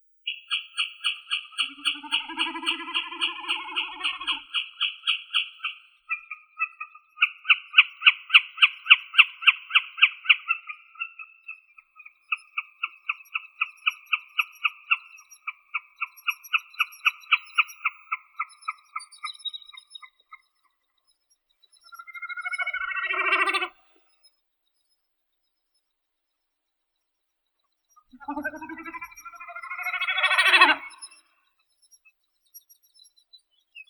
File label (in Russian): bekas gallinago gallinago